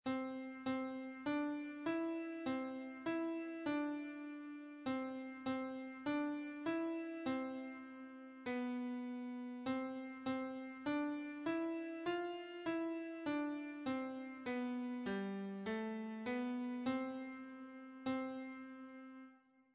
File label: Yankee Doodle Easy